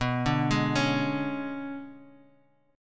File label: B-Minor 6th Add 9th B down Guitar Half Step Down